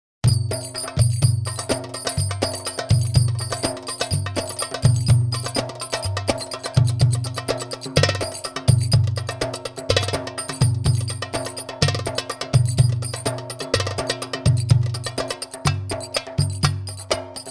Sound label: Saidi, Drums for Training